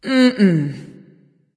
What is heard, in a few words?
nv disagree; smite; awilix